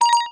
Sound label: UI Double Beep